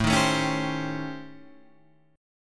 A 7th Sharp 9th Flat 5th A, Guitar Drop D; chord

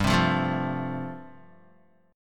G 7th Suspended 2nd Suspended 4th, Guitar; G7sus2sus4 strummed

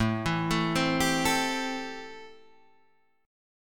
A-Minor 7th A; 5,7,7,5,8,5; arpeggiated; Am7

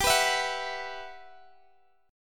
E Suspended 2nd G; Esus2/G; strummed

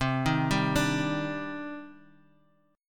C Minor Add 9th C; arpeggiated; madd9:1,b3,(5),9; JGuitar

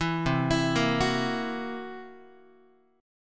C 7th Flat 5th C down Guitar; C7b5 arpeggiated; JGuitar

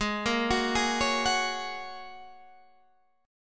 Ab 7th Suspended 2nd Suspended 4th; 16,13,16,13,14,14; arpeggiated

